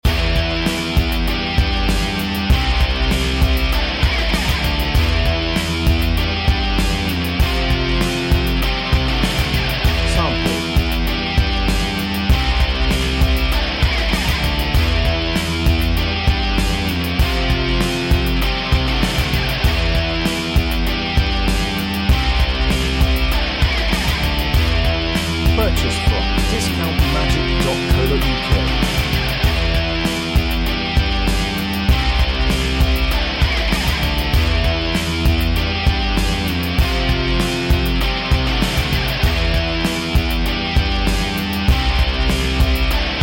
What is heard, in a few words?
rock da synth